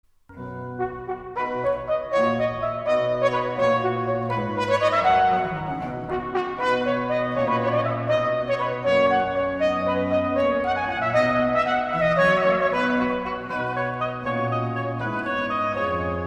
Sonata in C For Trumpet & Oboe In C Major - Allegro; Gottfried Finger